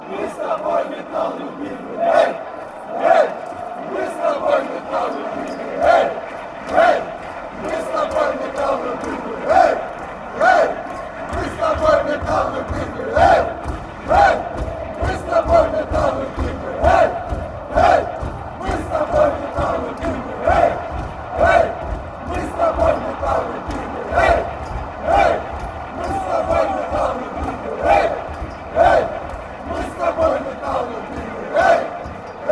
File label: metalist chant, fanchants